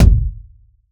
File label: rich mids kick single shot g sharp key; kick drum